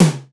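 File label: TOM, drums; East West; Global Sounds; SPD11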